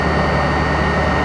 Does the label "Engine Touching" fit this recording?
Yes